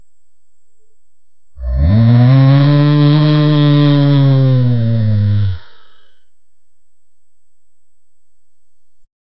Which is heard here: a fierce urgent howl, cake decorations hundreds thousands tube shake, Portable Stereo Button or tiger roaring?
tiger roaring